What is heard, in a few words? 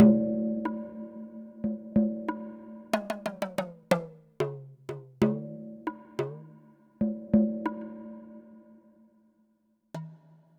Minimal Planet Drums; Ambient Cinematic